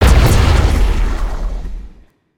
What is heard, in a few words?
Spider Boss Ground Slam; creatures mechanical